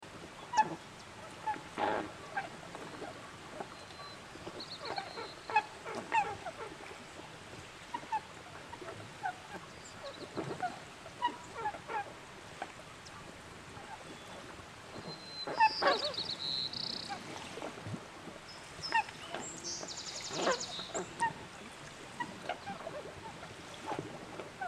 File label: Crested shelduck; پرنده تنجه کاکلی